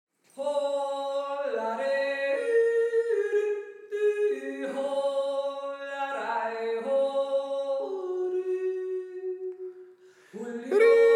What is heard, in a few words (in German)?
Tenor; Seckauer